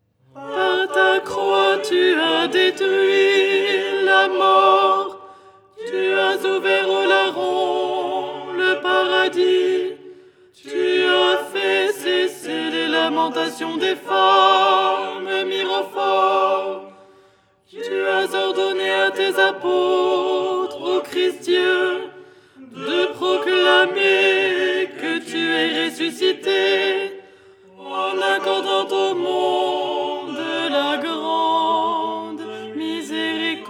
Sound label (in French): alto; Tropaire du dimanche; Les 8 tons en français